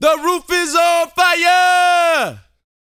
VOCAL, R&B Dance, HIP-HOP

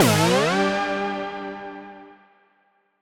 up G, Poly Chord Hits Ramp Up; future rave

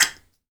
toy up, toys perc; AKAI S-Series CD-ROM Sound Library VOL-5

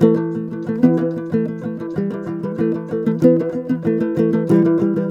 TOPSHUR; Soundscan vol.60; Asian Traditions; AKAI

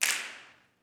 SNAPS; Vocal Planet Vol1 Gospel; CLAPS+SNAP

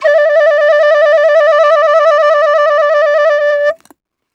Penny 102bpm D, Penny Whistle; African; Quazibeat; African Secret

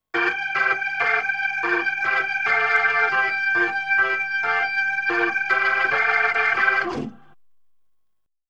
B3 Organ; Masterbits CLIMAX 8

